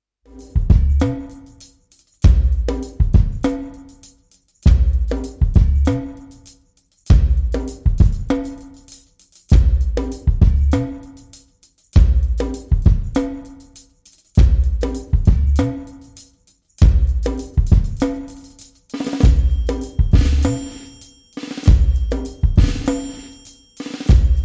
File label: pattern karaoke, drums